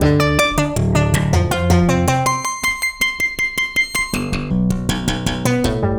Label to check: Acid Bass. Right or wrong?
Right